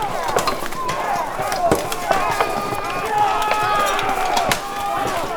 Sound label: BATTLE Medieval Reinact Footsoldiers Knights loop stereo